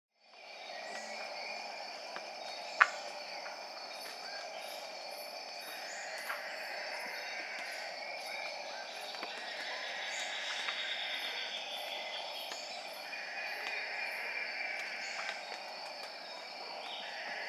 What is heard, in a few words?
Haddadus binotatus